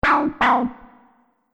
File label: Multimedia Error, beep; Beep Sound Effects